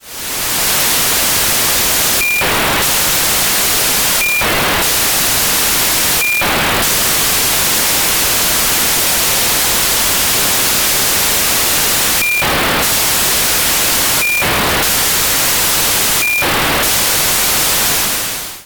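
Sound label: satellite; TW1A; AIRSPY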